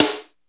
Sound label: 909 SNARE; E-MU Formula 4000 Series Vol. 2